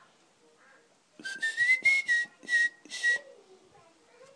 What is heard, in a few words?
ahhh ahhhhh